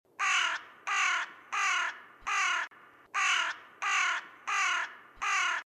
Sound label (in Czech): Havran polní Corvus frugilegus